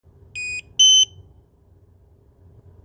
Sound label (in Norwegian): zebra feil lyd